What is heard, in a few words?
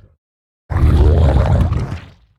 zerg variation; starcraft ii 2010